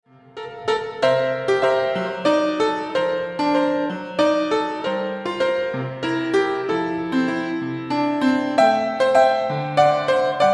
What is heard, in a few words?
Waltz